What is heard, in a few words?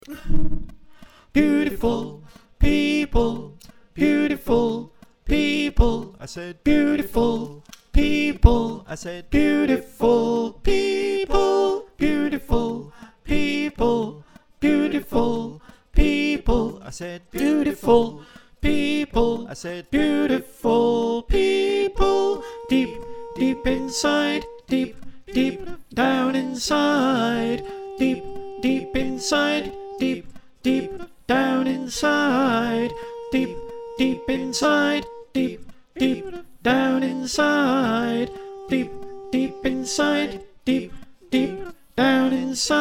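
Alto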